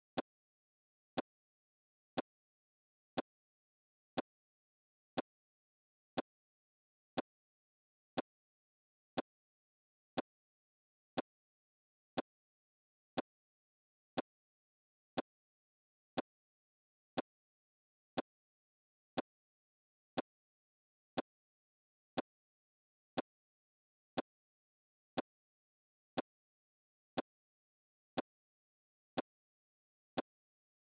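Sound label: sound of clock hands 1 min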